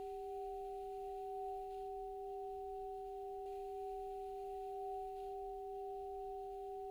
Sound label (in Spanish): Multiarmonicos